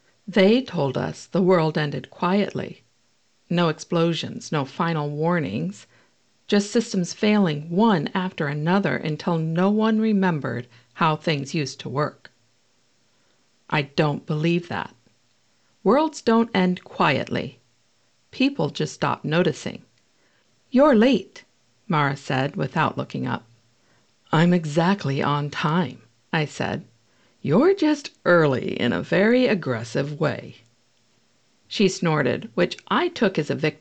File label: Dystopian